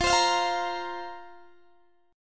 F Suspended 4th F, Guitar DADGAD

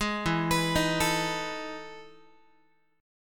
E Major 11th E, Guitar; EM11 arpeggiated

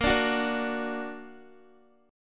Bb Augmented Bb Guitar; strummed; x,13,12,11,14,x

